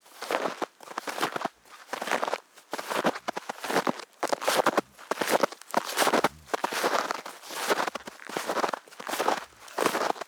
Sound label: footstep snowshoe walking slow; Almost Maine